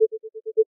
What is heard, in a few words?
phase; noteye; roguelike